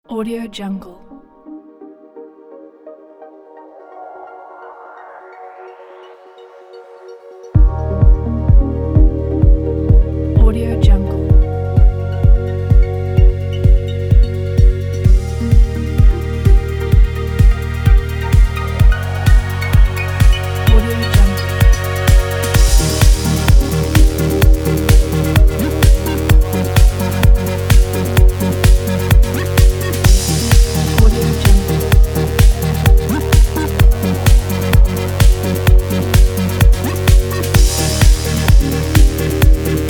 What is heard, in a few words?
into the future kit